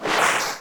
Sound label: Fish Jump Out Of Water